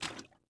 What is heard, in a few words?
fs water, footsteps